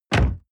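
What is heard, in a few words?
sports car exterior door close medium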